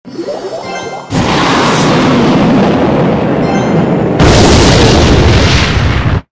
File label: rocket; clash